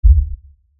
LFE Hit, Lfe Hit Low Bass Impact; Blastwave

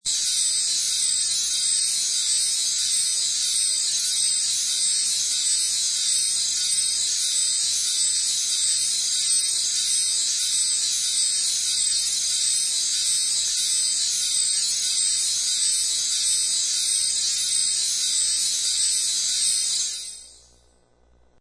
Windchimes, A constant windchimes sound effect.; Windchime Sound Effects; Musical

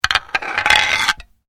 Bones Human Move

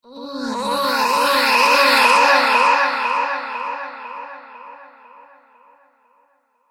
Female Space Orgasm, sci fi music

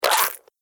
Cartoon Slime Splat; Slimy Sound Effects

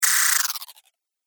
Android Rotor Sound, Robot Sound Effects

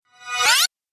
Rising Video Game; Video Game Sound Effects

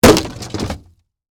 Impact Wood Smash, Heavy; Wood (Sound Effects:Materials); BlastWave

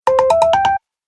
Message Notify Sound, Dong

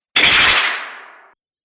Alien Whip Attack

